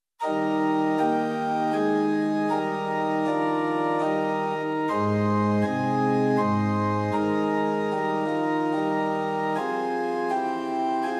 Liturgical Organ Pitch 440 Temperament Werckmeister; Werckmeister 1-3; Prinz 8 4; hymn